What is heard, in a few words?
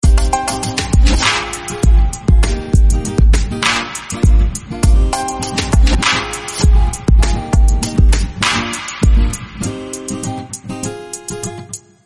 wakeup